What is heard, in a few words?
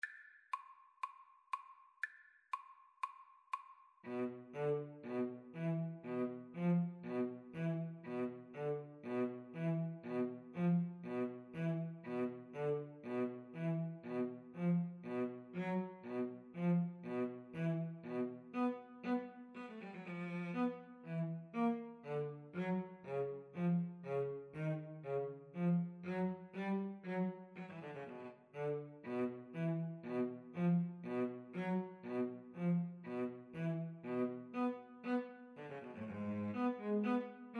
violin cello, Violin-Cello Duet; tchaikovksy sugar plum